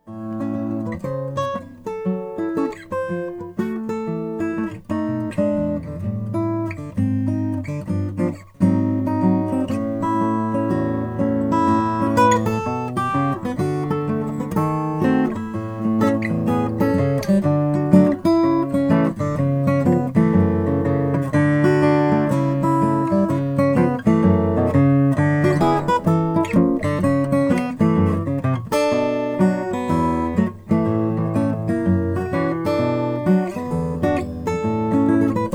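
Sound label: bourgeois db jumbo c custom african blackwood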